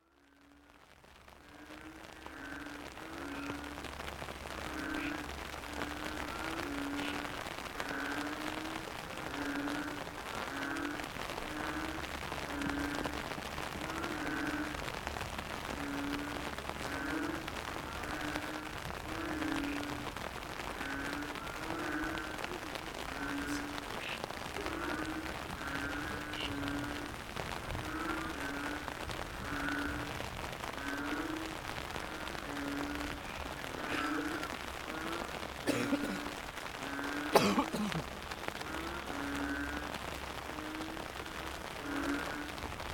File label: Yamaha Frogs, Cherating wetlands; This recording was made in the mid 90’s at Cherating … a sleepy little seaside village; 15.5mins; Malaysia; soundscape; altsoundings